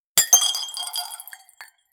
wine glass breaking

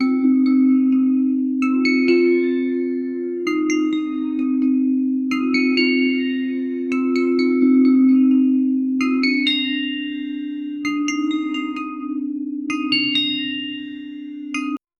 light creepy music

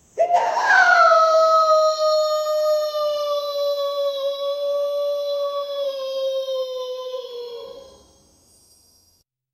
the monkey angrily crying

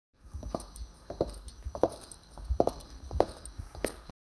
walking on spaghetti; humor

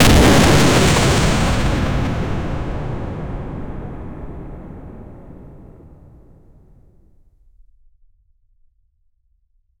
a powerful explosion sound; catastrophic

